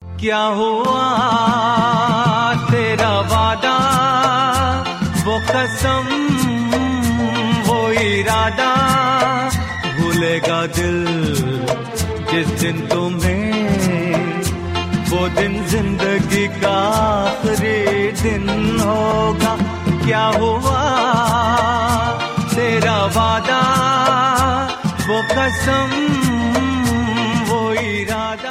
Old Hindi

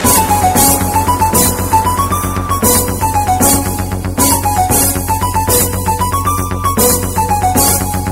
Instrumental Ringtone

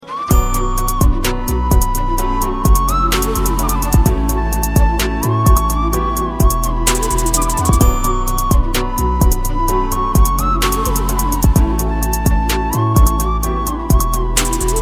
Instrumental Tone

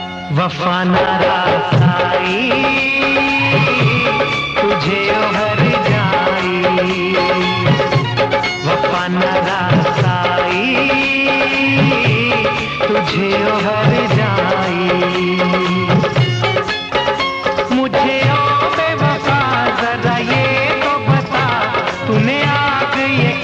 Old Sad Love Song

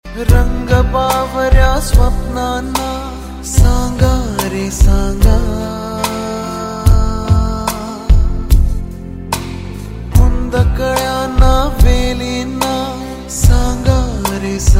Male Marathi Ringtone

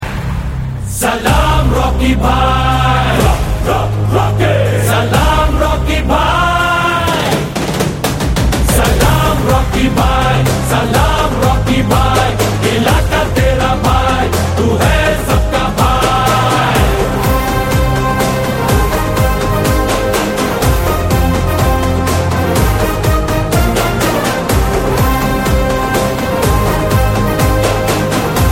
Tamil Song Tone; KGF

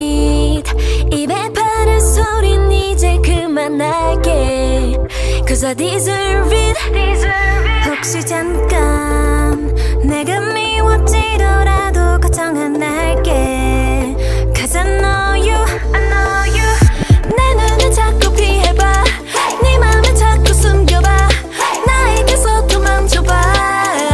Music Ringtone

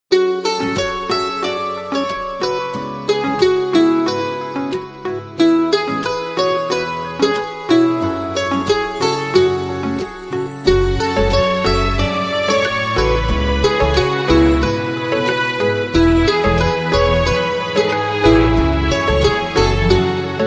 Song Instrumental Tone

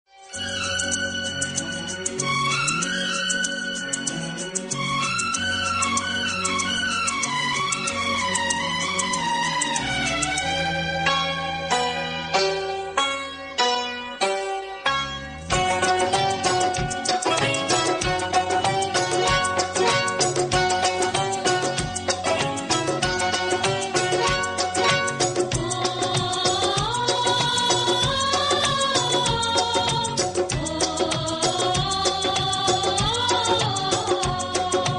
Telugu Movie Instrumental Tone

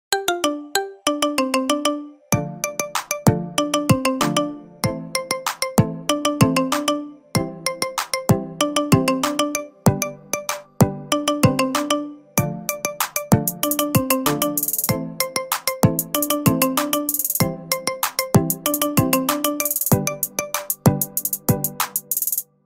Marimba Remix Ringtone

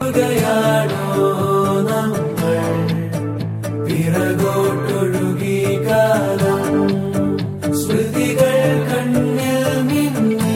Kannada Ringtone

